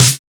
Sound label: snaredrums, techno; Techno ID